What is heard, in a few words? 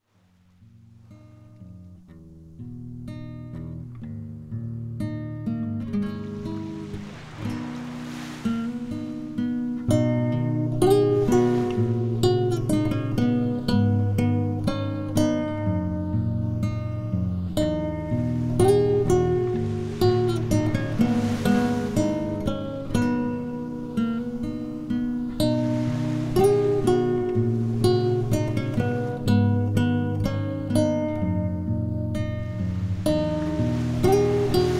Guiter Ver